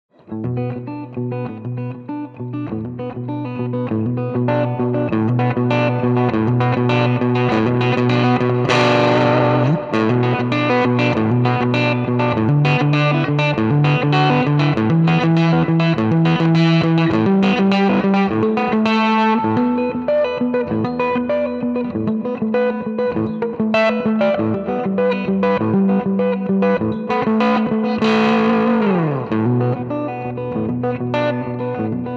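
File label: 6V6 Gretsch Dirt Drone with Tape Echo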